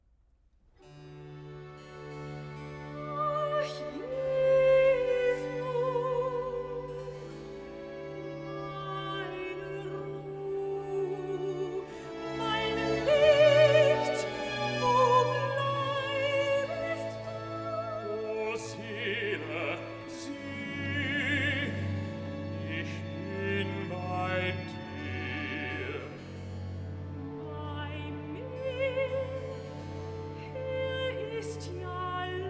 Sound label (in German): Recitativo (Sopran Bass); vocal; soprano; BWV021; Ach Jesu, meine Ruh; BACH